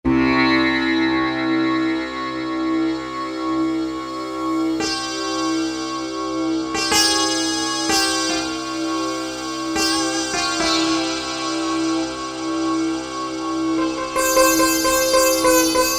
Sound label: Sitar